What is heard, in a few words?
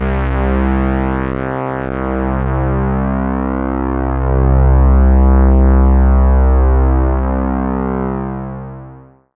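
strings C2, Oberheim OB-Xa Pads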